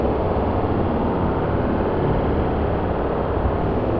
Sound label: sparse soundscape samples; ReLooped